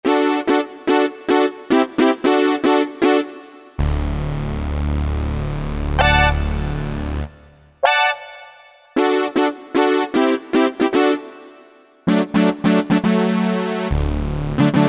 ANA BRASS; Akai SG01v

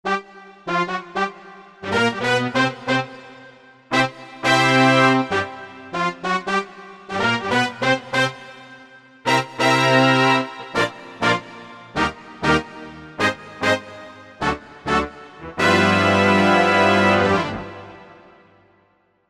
Octa Brass, Korg MSC-11S Brass

